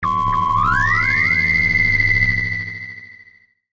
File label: UFO Bean Ray, Scifi, Ufo, Bean, Ray, Vintage, Science, Fiction; Spacecraft; BlastWave